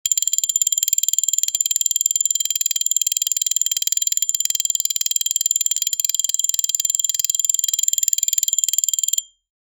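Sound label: continuous fast, mouse wheel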